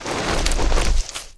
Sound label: Mad Slug walk; Seer; GamigoNA